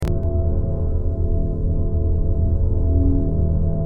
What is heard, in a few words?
Mag Generator; Skaro